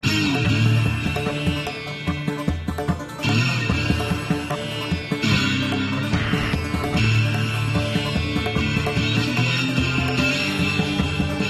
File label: Loop Fun Action; Tales from the Borderlands